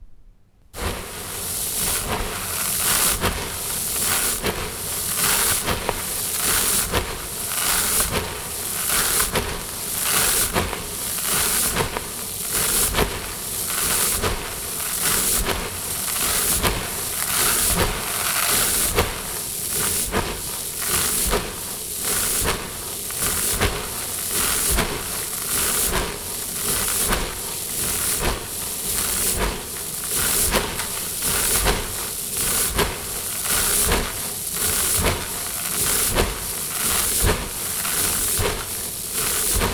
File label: bathtub full of lentils